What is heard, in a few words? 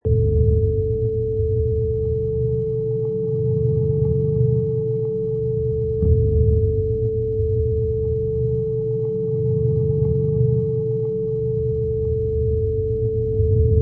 Hell Bass